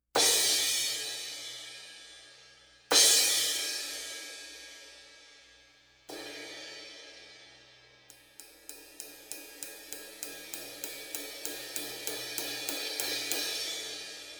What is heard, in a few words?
18 A Cust, Zildjian